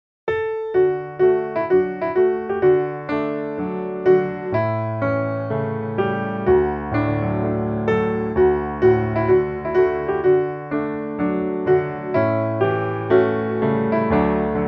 Eu tenho um amigo piano

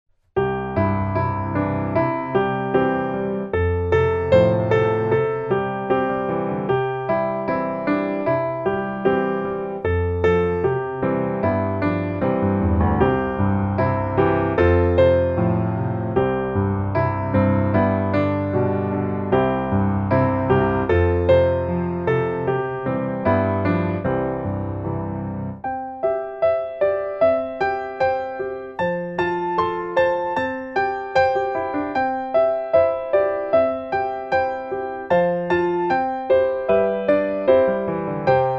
piano

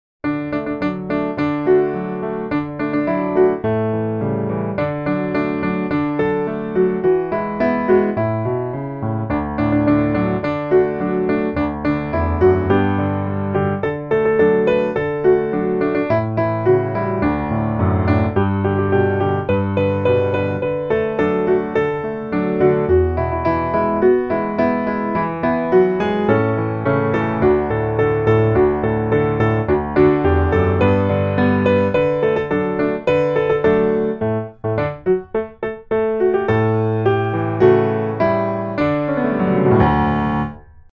Havia um pastorzinho piano

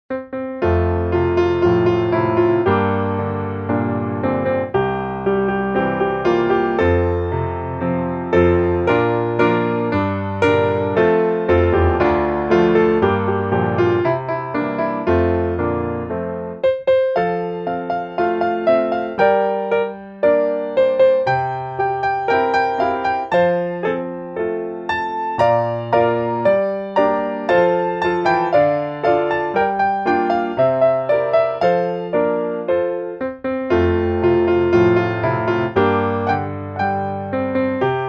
piano

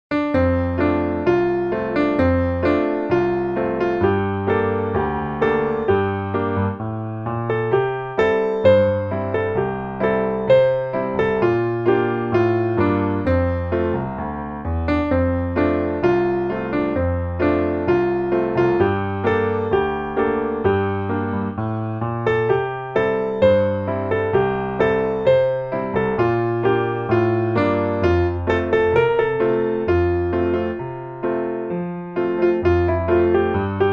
Em tudo quero ser fiel piano

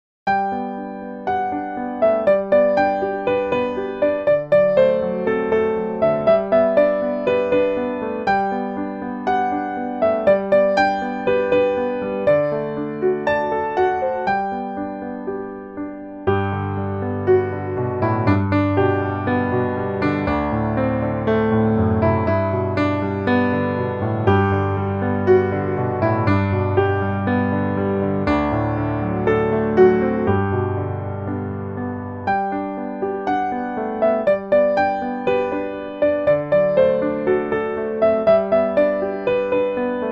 Quem fez piano

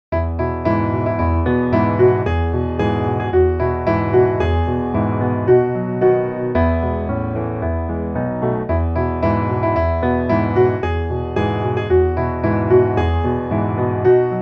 O Meu Mandamento piano

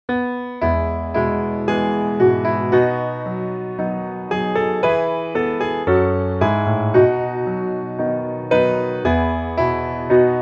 O meu tesouro e Deus piano

